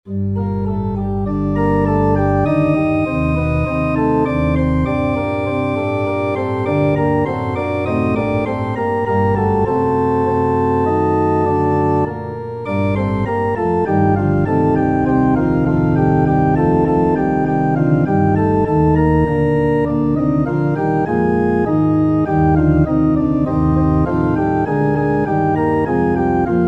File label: arioso for pipe organ; bach johann sebastian; heb ich denn mein auge sehnlich auf